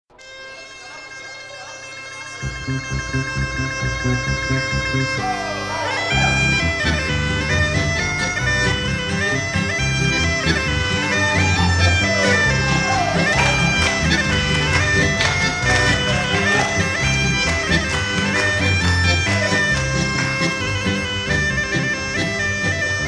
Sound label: Polka